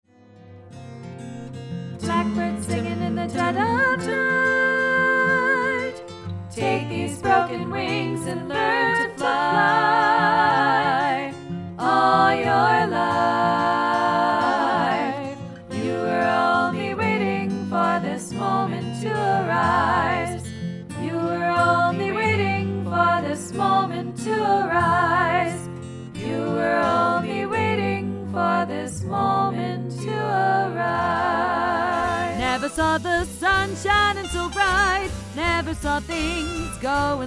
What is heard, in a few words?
Singing, female voices